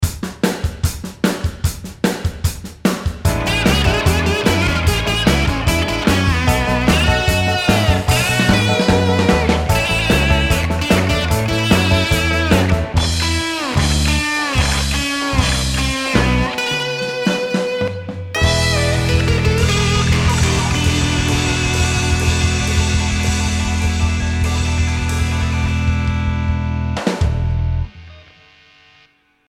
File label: slide